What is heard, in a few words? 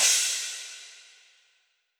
Instr Cymbal; Backpack Hero